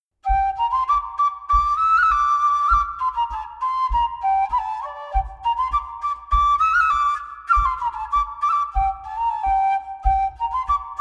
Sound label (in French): Bourree berrichonne